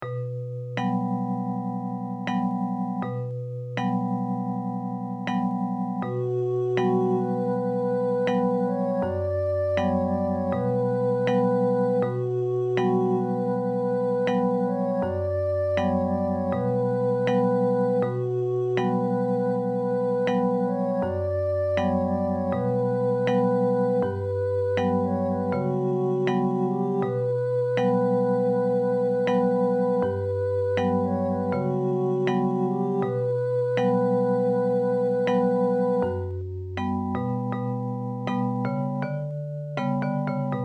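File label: songs vocal & guitar; 4 songs; pirika pirika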